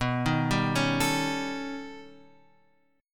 B Augmented 9th B down Guitar Open F; B+9; arpeggiated; JGuitar

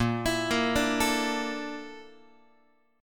A sharp Major 7th Flat 5th A sharp Guitar; arpeggiated

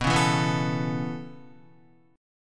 B 7th Suspended 2nd Suspended 4th B down Guitar; B7sus2sus4 strummed; 4F,4A,5C,5G,5C,6E; chord shape; JGuitar

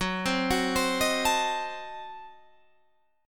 Gb Diminished 7th Guitar Standard; arpeggiated; JGuitar

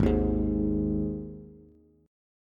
Ab 5th Ab Bass; strummed; JGuitar

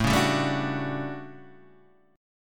A 13th, Guitar Standard, chord; strummed

